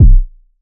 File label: kick; FL Studio; xander brown drumkit